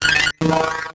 Production Element Title Transition Communication Transmission